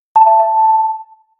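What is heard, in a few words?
hover button for game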